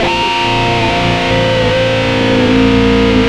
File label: lead (classic bend); Sonic Foundry (Sony Creative Software); Ugly Remnants VOL-2; Leads